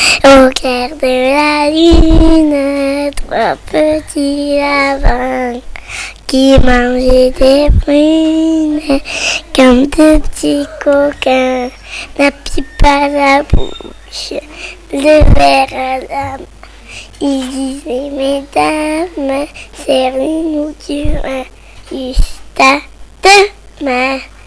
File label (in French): chante lodie